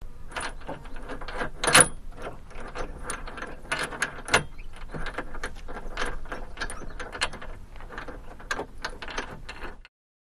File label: Mule Cart Chains